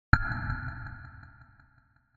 Tone

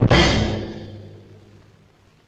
Bass Drum Cymbal Hit; Vintage Cartoon; Drums & Percussion

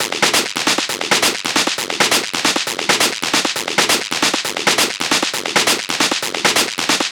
Perc Loop 135, Techno; Loops; Drums; Ghosthack; AC2024 - Day 1 - Techno Sounds 2025; Percussion

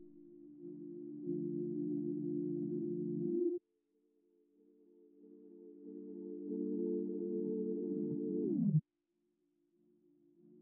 92 BPM D Min Sine, loop stems; Melody; Cymatics; 2022 Melody Collection Platinum Expansion - Pt 2; Top Floor

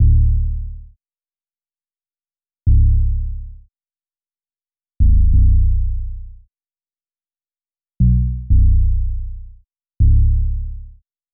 90 BPM F# Min Bass; Loop Stems; Cymatics; Lucid

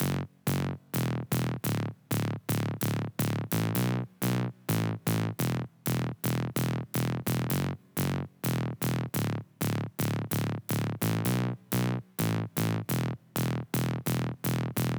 128 BPM F# Min Bass; House; Drop Loops; Cymatics; Glider

